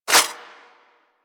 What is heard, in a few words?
Throwing Star Whizzby; Warframe; Weapons